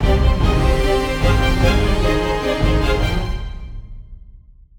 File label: battle, Orchestral Background Music; Epic Adventure